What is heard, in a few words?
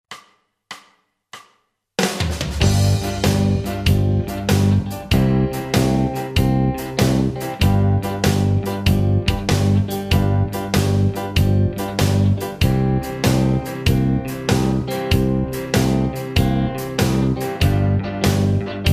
Double Stop Stomp